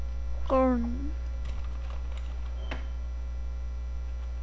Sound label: groan